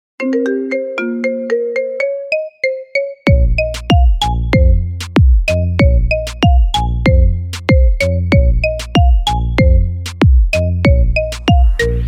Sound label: Marimba Remix